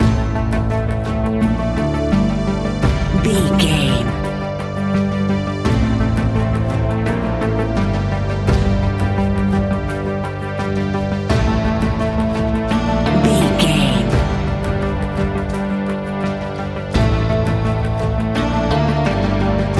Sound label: daunting rising tension; Daunting Rising Suspense 30 Sec; Catch 22 Music Network